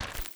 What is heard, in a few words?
ui tab switch